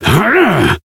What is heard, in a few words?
Voice attack